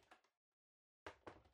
Electric Fan (Far-Put Down)

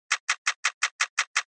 Camera Compact (Shutter)

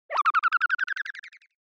Motion Slide Slow

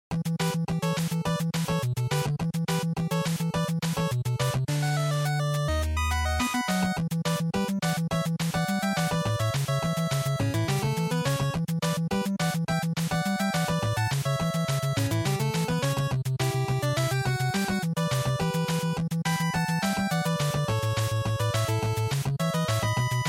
NES Action Stage

